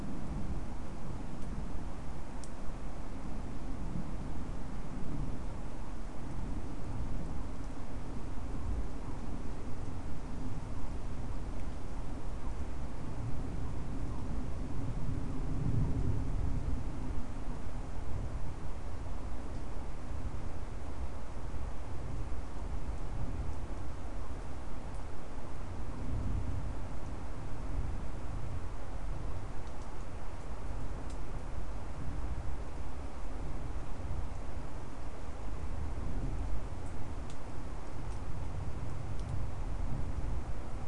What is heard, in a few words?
no obvious biophony